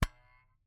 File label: Camera, Aimex SP500, point n shoot, plastic, knock; Eiravaein Works; Vaeyan IV